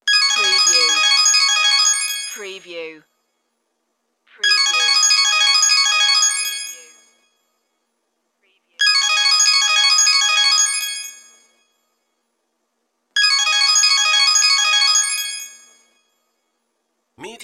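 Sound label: Cell Phone 4 Rings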